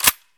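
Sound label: weapon bolt in; Escape from Tarkov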